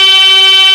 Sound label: FUZZOR G3, SYNTHESIZER; GLUNGE